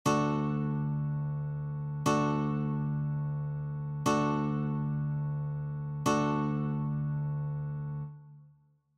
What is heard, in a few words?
D Chord, guitar; childrens